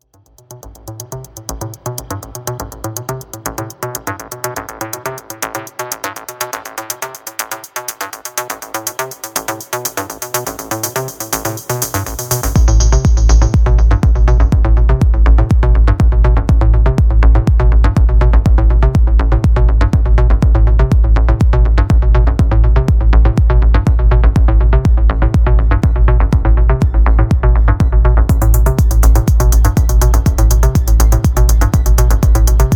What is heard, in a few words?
random notes orchestra